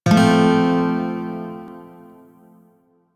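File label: Shawzin Major Chord G, Music; DE; Warframe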